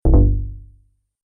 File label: Tired Error, beep sound effects; Object Sound Effects